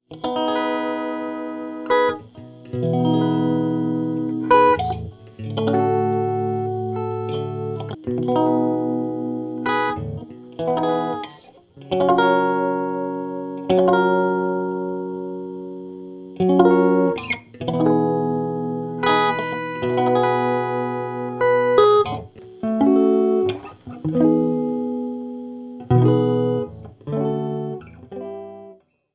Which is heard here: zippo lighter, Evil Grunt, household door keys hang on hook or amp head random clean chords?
amp head random clean chords